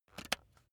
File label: razor attachment retract soft